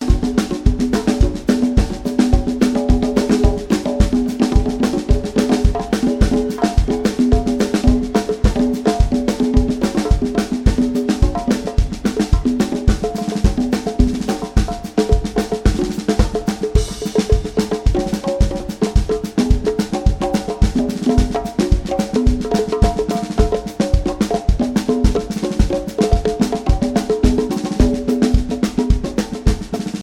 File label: Drums